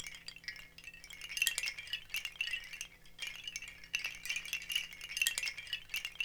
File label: BAMBOO, Percussion; ILIO; Synclavier Percussion 2; World & Orchestral